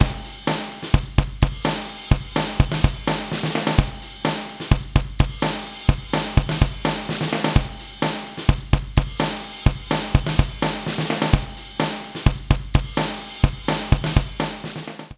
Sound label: drums mix with para comp